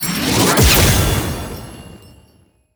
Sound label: second Breath cast